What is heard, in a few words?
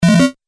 Multimedia Lo-Fi Correct, beep; Business Programs, Apps And Computing Noises; Andrew Fleming